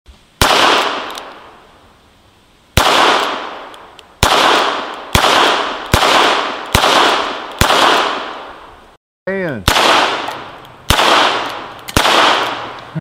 sw model 617 po raznym celjam

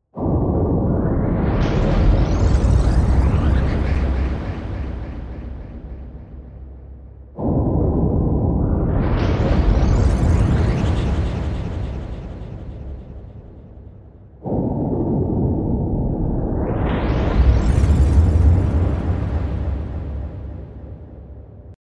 tri kosmicheskih zvuka; Короткие звуки для монтажа — для transition, движения, смены кадра, звуки для выхода эффек

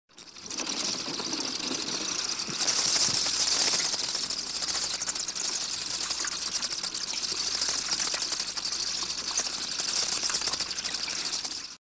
strekotanie i perelety

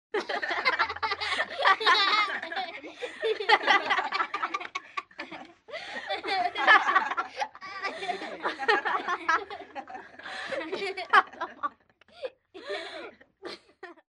pjatero dete zarazitelno smejutsja

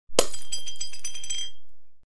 malenkij flakonchik

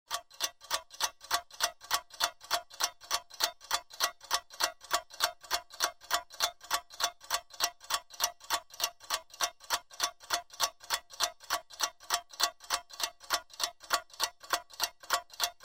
nastennye tikaut; Тиканье часов

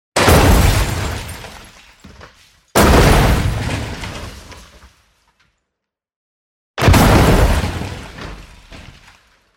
tri varianta udarov mashin

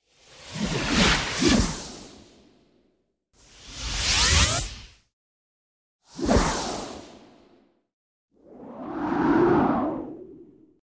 Varianty povorotov

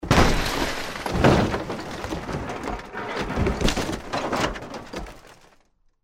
zvuk padenija

